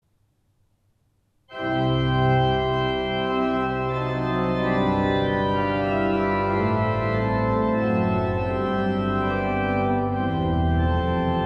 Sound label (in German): Präludium in C-Dur; Gustav Merkel